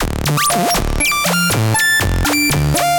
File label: Sqeak, Synth; Ableton; Factory Packs; The Forge by Hecq